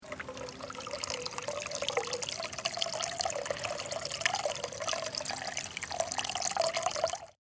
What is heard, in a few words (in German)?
Spielplatz Wasserpumpe